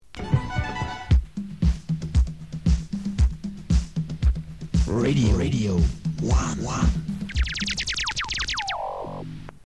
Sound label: radio one jingle